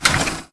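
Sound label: Stalker Movement Servo Damaged Walk; Titanfall 2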